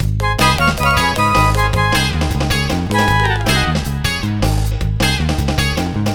Flutes & Swingers Full G#; Zero-G Kocktail Kollection; Construction Kitz (Lounge); Flutes & Swingers 156

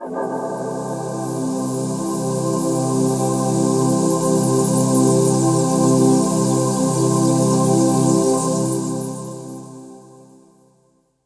Ambient Pad, Drum & Bass Melodic Synth

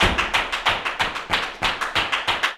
flamenco tapping, jaleo; Best Service ProSamples vol.46 - Flamenco

